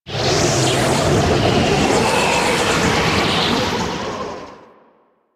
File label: Powerplant Jettison Whoosh Large; Ambience; Warframe; Corpus Railjack; Tether Power Plant